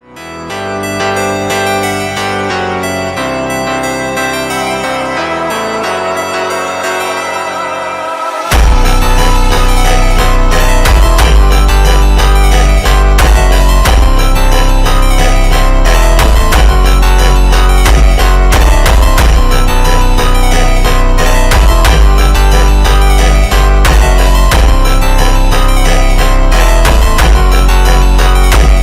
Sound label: phonk remix